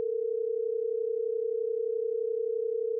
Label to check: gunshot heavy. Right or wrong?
Wrong